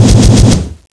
skill whirlwind start; Frozen Terror

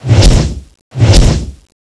skill throw; Frozen Terror

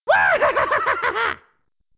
deathball; db mod 15; superbuu

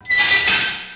door gate close; left4dead

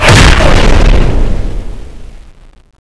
gunkata skill last exp

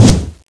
skill whirlwind end; Frozen Terror

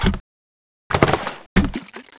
waterpistol reload; cstrike

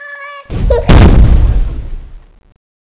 bomb nuke after end; CSO LightZombie

